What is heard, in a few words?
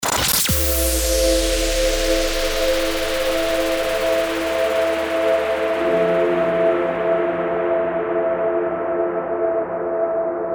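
drone, 90 bpm, A minor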